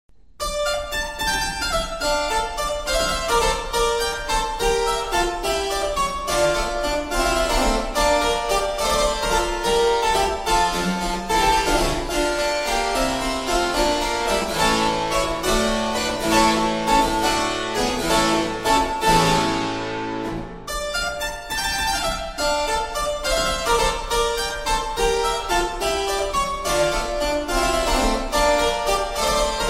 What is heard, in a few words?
suite in d minor